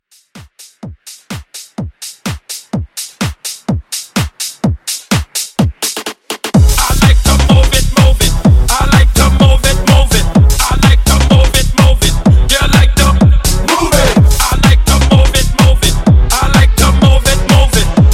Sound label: 126 clean